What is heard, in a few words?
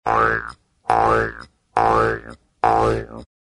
comedy frog hops